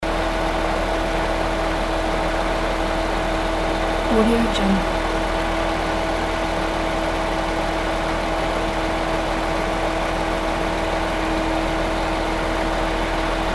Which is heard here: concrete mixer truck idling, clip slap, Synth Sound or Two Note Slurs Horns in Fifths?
concrete mixer truck idling